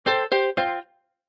Casino Electronic Sound Game Over, Slot Machine: Electronic melodic sound; Casino, gambling; Lynne Music